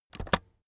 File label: keyboard external space press fast